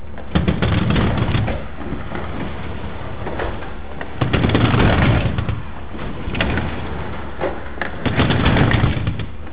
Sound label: dry torsion springs, garage doors